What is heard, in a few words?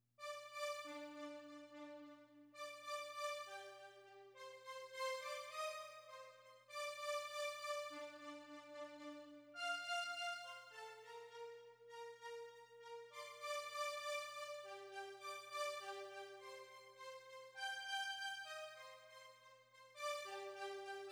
synth